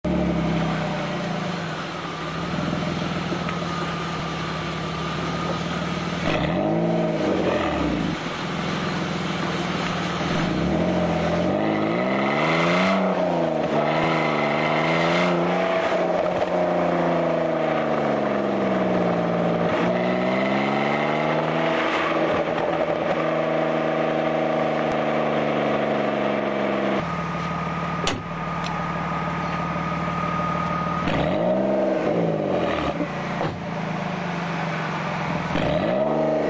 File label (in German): auzpuff, Z3 2.0; Z3 2.0 Sound